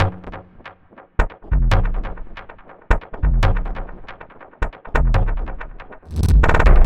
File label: Run Percussion, percussion loops; KJ Sawka; Drum n Bass & Beyond; Drum Tool Box